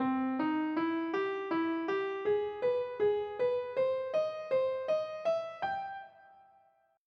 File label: augmented ex; The Augmented Scale